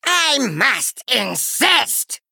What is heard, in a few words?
Male Murloc Attack